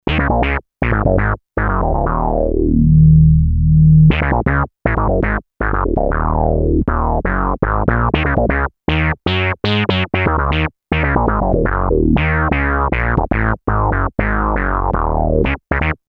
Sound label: bass moog, novation bass station rack; Bass: wet